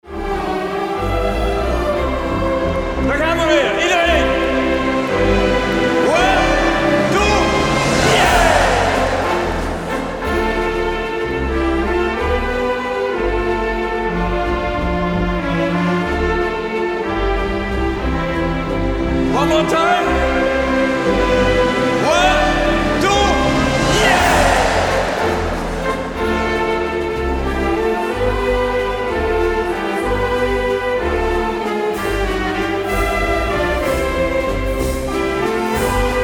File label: waltz